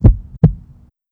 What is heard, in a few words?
heartbeat slow; Shattered Horizon